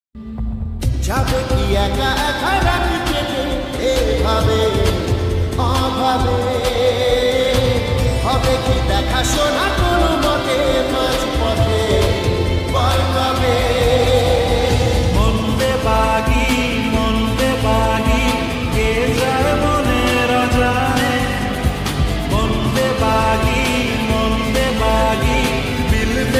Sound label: lofi, Bengali ringtone